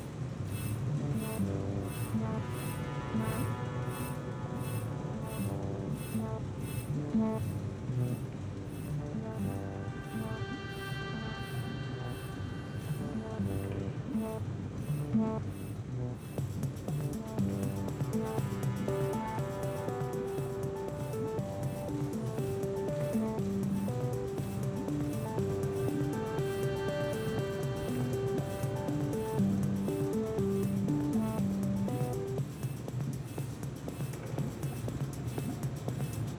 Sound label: drums fade in + fade to drums; CommandBlockBuild E; mcsm music; Netflix